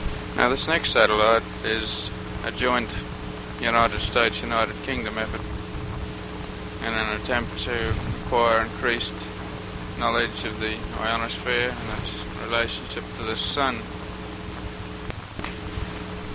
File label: ariel-1 omikron-1 1963 Satellite Sounds; Bruce Window's 1963 Satellite Sounds tape